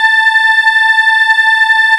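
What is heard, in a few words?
VOX A2, CHOIR; TOMVOX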